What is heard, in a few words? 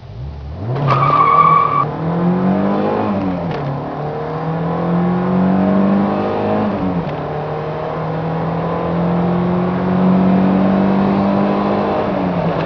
launch, 1987 Scirocco 16v; 16v engine